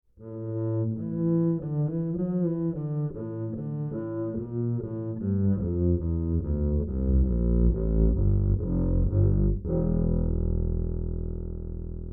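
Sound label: acoustic bass Bowed direct input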